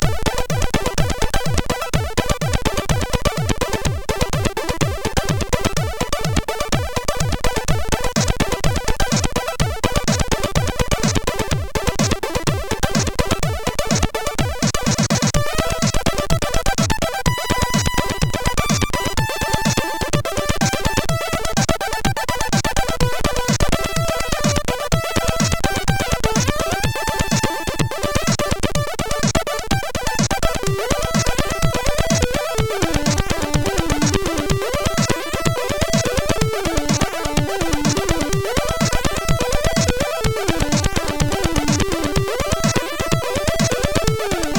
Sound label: Naughty, sid CSG8580R5